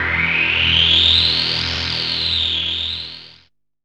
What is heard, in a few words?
DR. LOOP, TRANCE; X-Static Goldmine 3